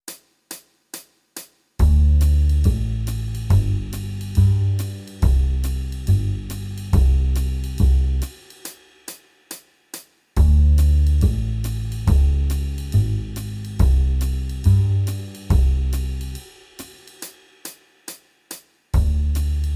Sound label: Jazz backing